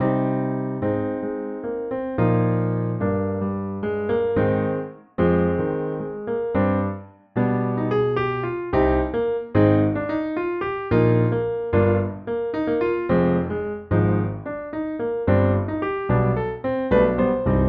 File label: piano dry